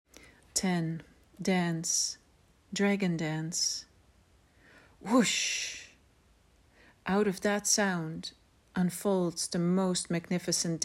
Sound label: dance dragon dance